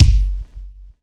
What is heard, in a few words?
BDRUM; E-Lab; X Static Goldmine 2; akai